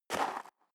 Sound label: step leather shoes gravel light single left